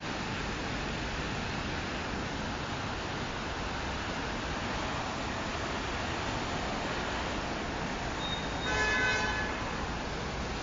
New York rain traffic and car horns under the expressway; bruckner expressway